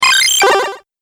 Retro Random Bleep Accent; Video Game Sound Effects

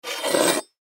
Machete Pick Up Wood Surface; Warfare; Knives (Sound Effects:Combat); Blastwave

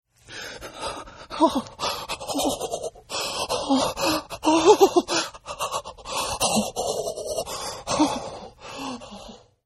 Shiver, Sickness Sounds; Human

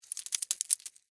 Spider Run Stop, Scurry, Movement; Animal, Spider; Arachnid, Insect, Bug; Spider Sound Effects; BlastWave

